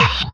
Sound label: Combo Chop; FX Hit; Drums; Ableton Live 12 Lite; Core Library